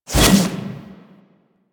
Melee Jump Slam Large Charge, weapons melee whooshes; Warframe